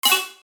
Digital system error

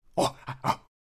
Monkey vocalization

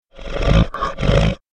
Bear growling and snarling; animal

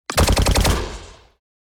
Sci-fi weapon assault rifle bursting

